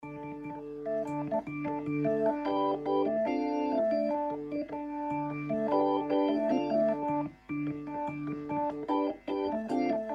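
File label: Echoes, Hammond T-522 Tone-wheel Organ; Gain fairly high and 16' and 8' drawbar, combined with lower manual.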